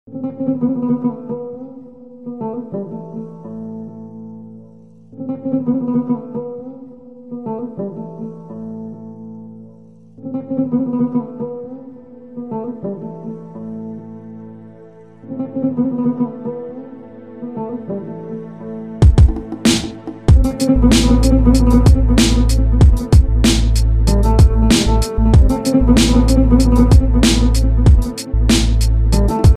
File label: beat